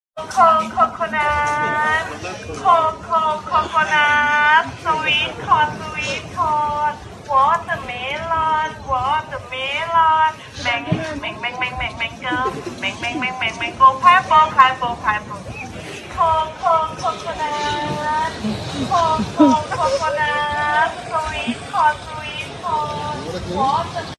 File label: thai fruit song